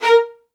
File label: A#4, LOUD VIOLINS; Q Up Arts; Denny Jaeger Master Violin Library